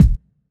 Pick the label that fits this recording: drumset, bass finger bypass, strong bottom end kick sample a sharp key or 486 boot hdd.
strong bottom end kick sample a sharp key